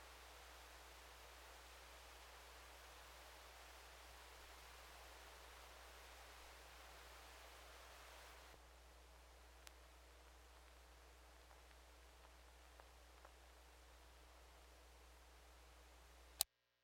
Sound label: Cassette Tape Hiss Stop Crackle Click